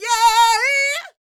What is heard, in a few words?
D YEAH, Vocal R&B Dance; DB DANCE